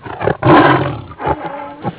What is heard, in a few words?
Tiger